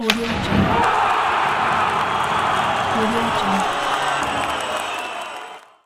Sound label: ice hockey goal